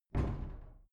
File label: cupboard kitchen close